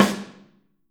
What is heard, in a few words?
Snare OH; Snare OH All; Drums Percussion